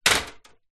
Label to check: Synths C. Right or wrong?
Wrong